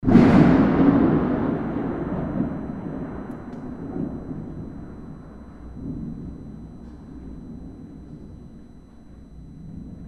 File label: thunder; kanayagawa